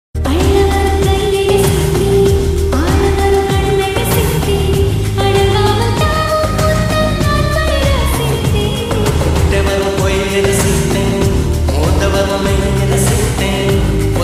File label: tamil love song ringtone; kuruvi